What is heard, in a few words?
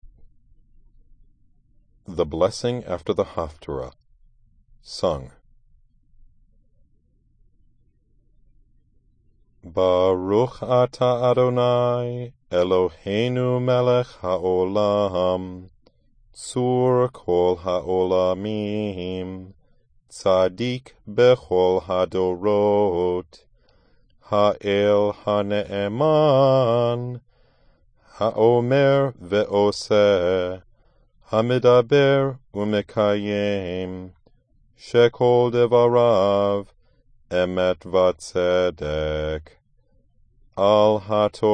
haftarah blessing after short sung